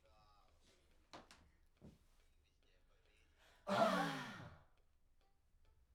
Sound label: Backs SCHOEPS